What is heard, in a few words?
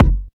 raw kick drum single shot F sharp key, Royality free steel kick drum tuned to the F# note; kick drum samples